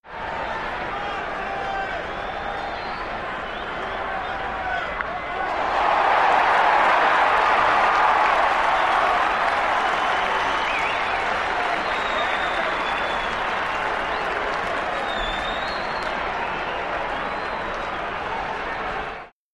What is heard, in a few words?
Arena Crowd Cheer, sports arena